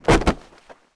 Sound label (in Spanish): CUERPO CAER DESATADO